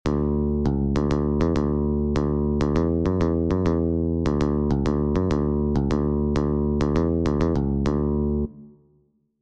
linear bass guitar; Contour recognition

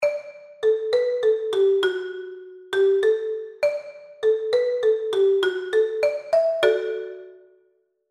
3 4 time marimba; Meter

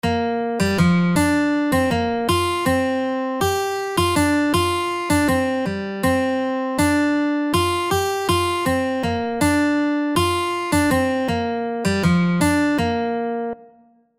5 9 8 time guitar; Meter

